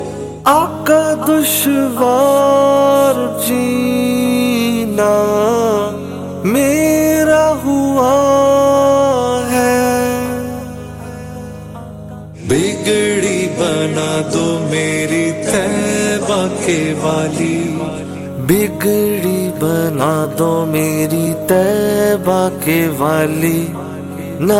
Islamic ringtone

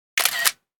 iPhone X Camera Ringtone

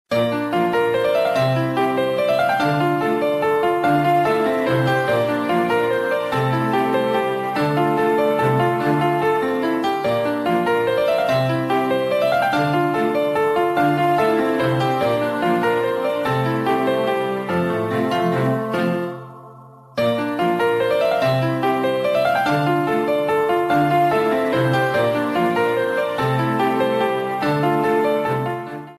ringtones; Samsung Ace C

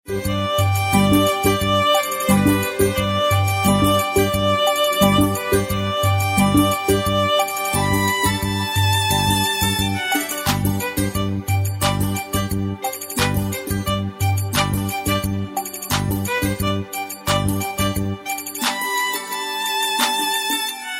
Hindi Music Ringtone

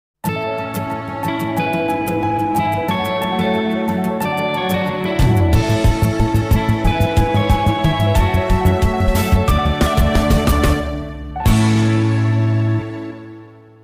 Oppo Realme 10 Pro Ringtone

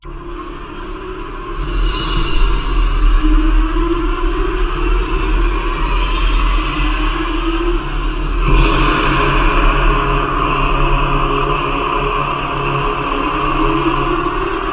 Hollywood BGM English Ringtone

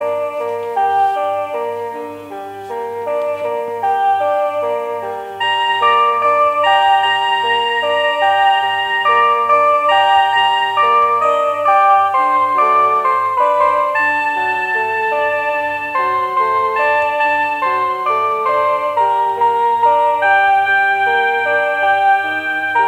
Instrumental Piano Ringtone; Samsung

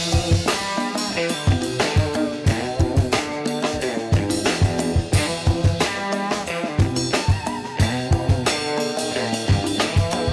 Clean Ringtone; Huawei Tune

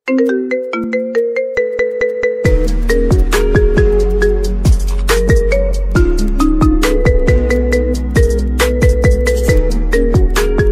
Marimba Remix iPhone 13 Ringtone

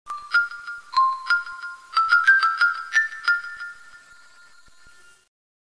Huawei Ringtone; Huawei Mate 40 Pro Day Lily

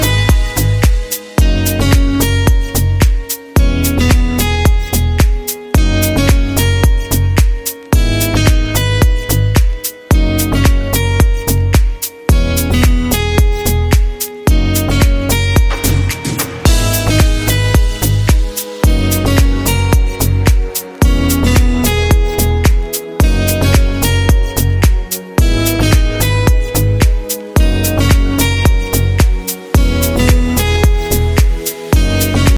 Winter Vibe English Music Ringtone

